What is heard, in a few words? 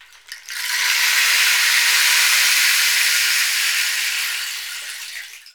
RAIN STIC; Latin Drums & Rain